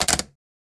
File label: putting down receiver